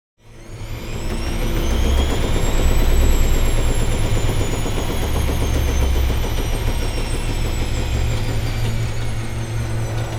Washing machine washer clothes spinning spin cycle; appliances